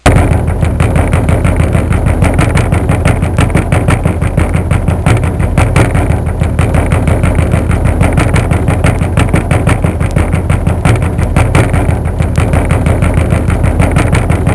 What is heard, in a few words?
Metal Thumping